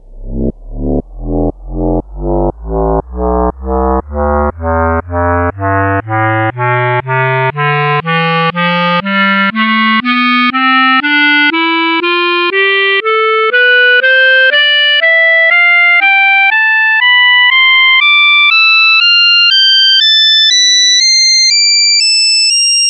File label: clarinet tonleiter